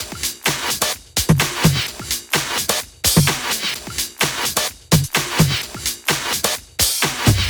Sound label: 128BPM Kit; VFH1 128BPM Bones Kit